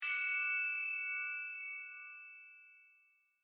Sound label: Triangle single; sus